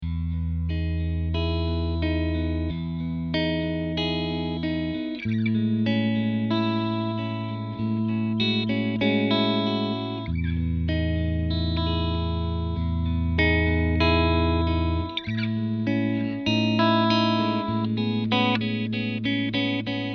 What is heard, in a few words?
Jazzmaster pickups